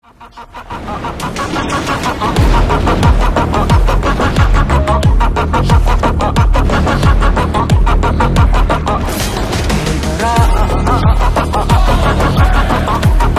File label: BGM Drum Beats Ringtone; Devara